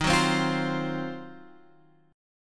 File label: D sharp Minor 6th Add 9th D sharp down